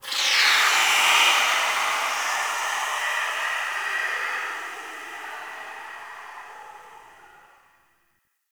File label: Gas steam release; Warframe; Corpus Gas City; Ambience